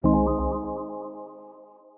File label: match start; Seele 【selyu】